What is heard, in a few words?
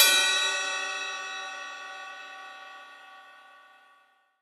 Ride Real Tasty; Drums